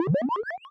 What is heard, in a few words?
level completed; sfx; CultManor